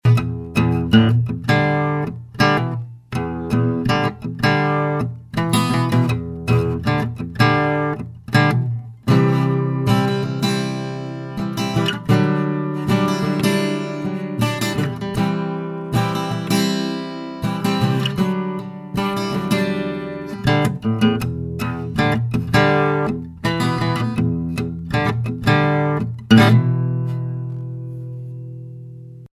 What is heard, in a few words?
Atmosphere